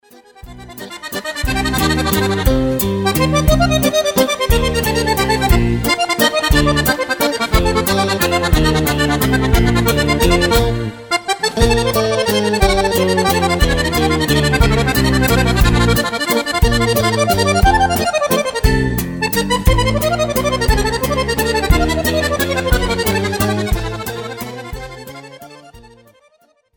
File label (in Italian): elettrico, accordeon